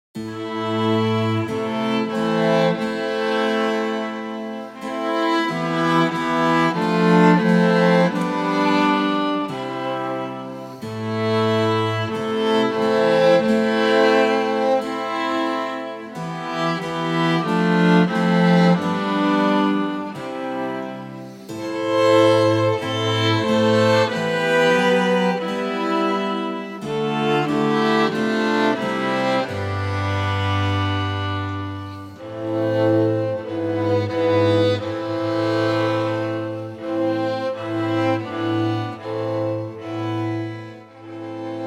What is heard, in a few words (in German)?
STRINGS; Lied